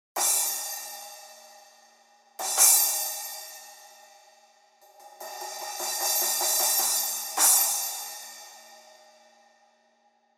Custom Splash 12

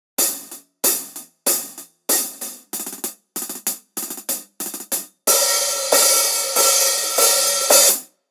Iris Hihat 15

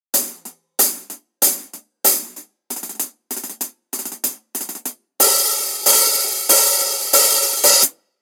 Custom Hihat 15 Rock

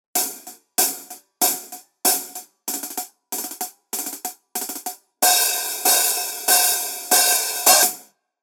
Hihat 13

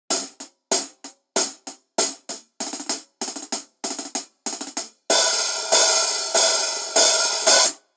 Custom Pointer Hihat 14

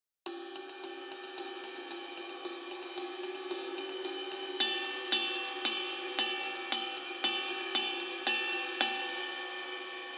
Custom Ride

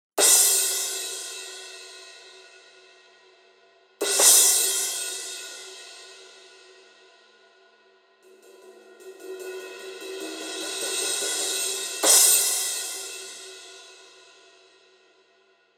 Crash 18 Paper Thin

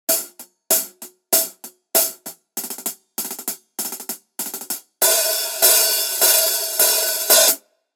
Iris Hihat 14 Heavy

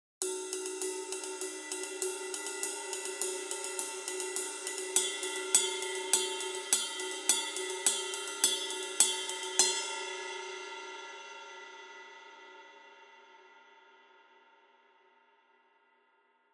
Ride 20 Heavy; Iris

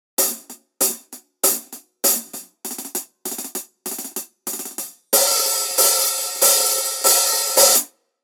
Hihat 15

